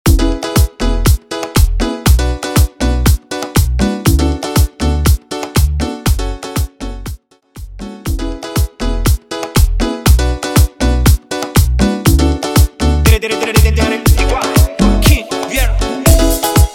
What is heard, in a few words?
Mambo Project 120 BPM; bootleg merengue remix